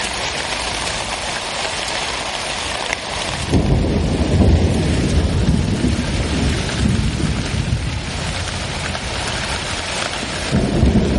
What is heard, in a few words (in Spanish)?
Truenos en Yokohama